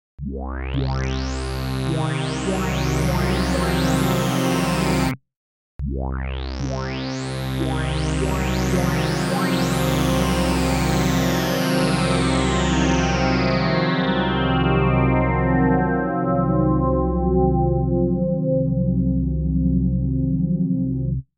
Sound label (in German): breit